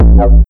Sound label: Kick Old Womp